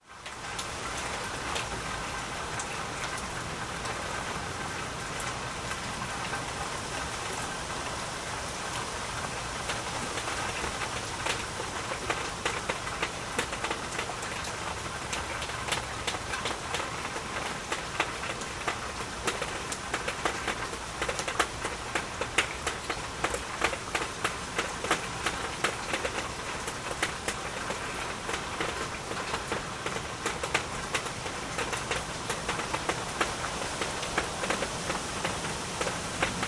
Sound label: rain light; rainfall